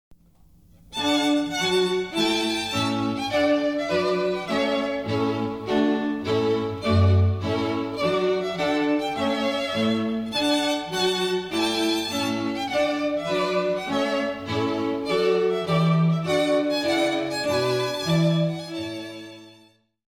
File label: trumpet tune